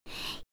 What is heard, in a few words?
br Medium, BreathWav